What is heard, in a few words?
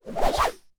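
infantry sling, weapon; Battle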